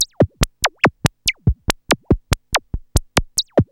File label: MACHINE BEAT; Zero-G Ambient 1